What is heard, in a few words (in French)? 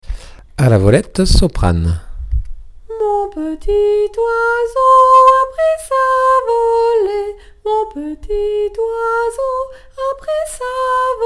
soprane, A la volette